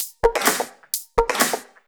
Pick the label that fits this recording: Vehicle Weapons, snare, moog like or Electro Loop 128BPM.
Electro Loop 128BPM